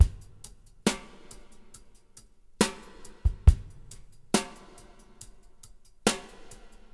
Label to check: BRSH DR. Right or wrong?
Right